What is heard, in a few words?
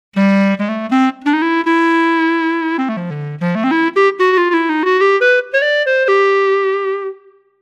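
Jazz Clarinet; TURBO VL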